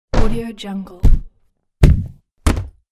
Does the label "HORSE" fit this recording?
No